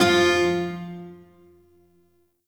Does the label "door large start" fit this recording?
No